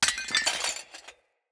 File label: Vase Porcelain Small Break; Vases